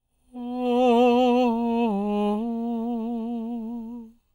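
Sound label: falsetto, Vocal Planet Jazz FX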